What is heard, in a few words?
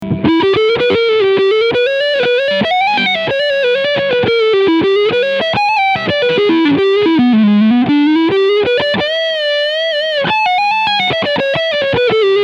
L7 TBL Leadsound Neck PU; Larry Carlton